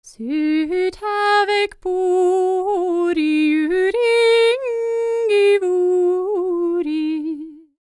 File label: SCANDINAVI, World Voices; ILIO; Vocal Planet VOL-5